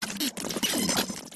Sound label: glitch squelchy; silverplatter audio